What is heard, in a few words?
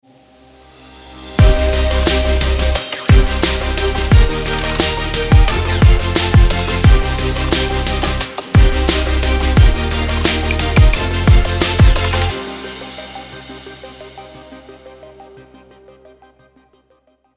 sport motivation